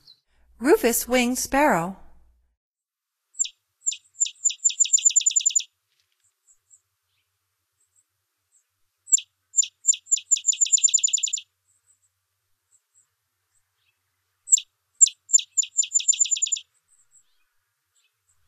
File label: Rufous-winged Sparrow, Songs of Birds of North America; Stokes